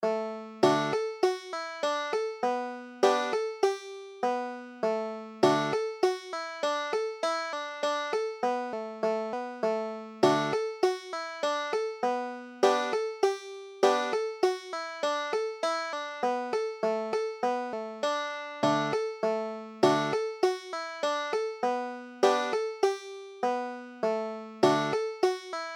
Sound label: rocky mountain goat, banjo